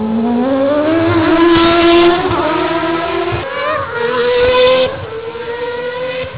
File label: racing